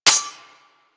Melee Sword Impact Generic; Warframe; Weapons; Swords

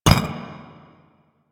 Footstep; Warframe; Warframes; Qorvex

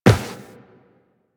Run Metal, Footsteps; Warframe; Tenno

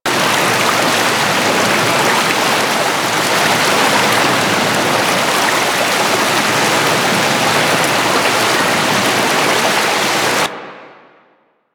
Camp River Loop A; Warframe; Quests; TheNewWar; Ambience; Drifter Camp

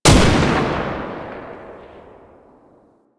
Belt Fed Rifle Shot; Weapons; Warframe; Tenno; Frumentarius

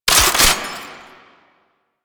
Belt Fed Rifle Reload; Weapons; Warframe; Tenno; Frumentarius

